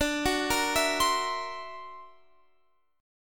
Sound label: D Augmented 9th, guitar